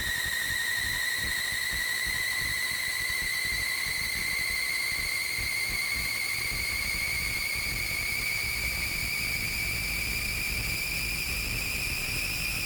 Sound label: The Choice Loop Riser